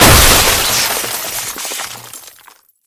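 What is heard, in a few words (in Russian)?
разбитое старое стеклянное окно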